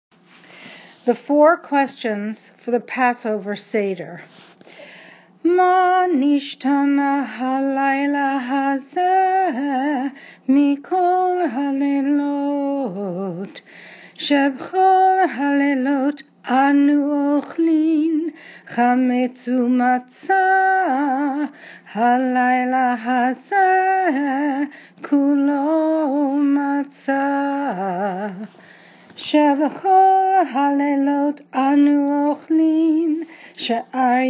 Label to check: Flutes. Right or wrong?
Wrong